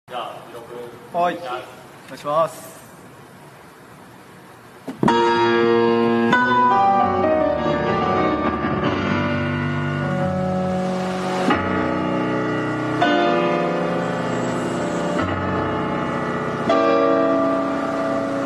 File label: piano